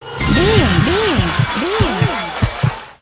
big win; Gem Star

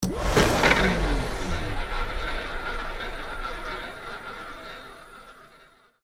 ball run, roulette; Roulette Royal

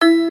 step; Diamond Monkey

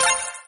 collect; Fenix Play 27 Deluxe; Wazdan

